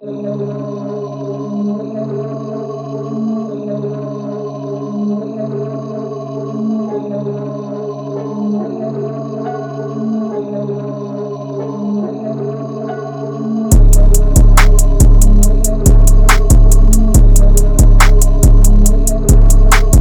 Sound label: BEAT